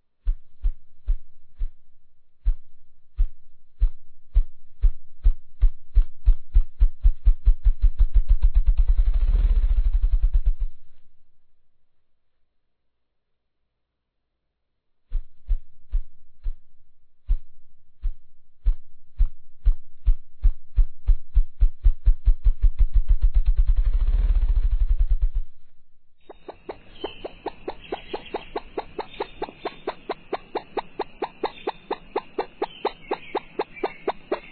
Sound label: Ruffed Grouse, bird calls; Envirothon Jr. High Resources; Jr. High Enviro Wildlife Resource Packet